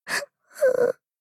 cry

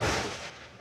battle lightning hit

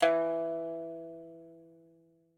pipa

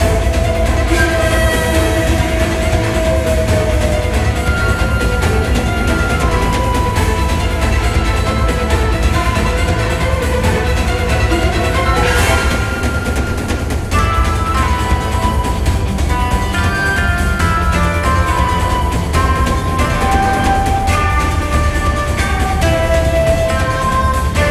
bgm battle; longgong

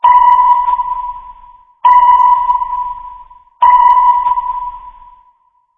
battle scan

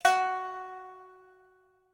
pipa

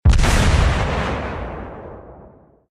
torpedo hit

